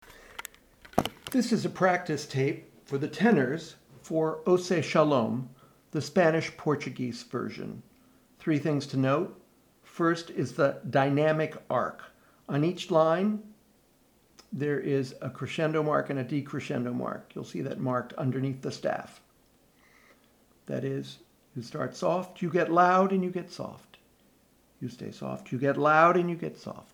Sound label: tenor; Oseh Shalom; Spanish Portuguese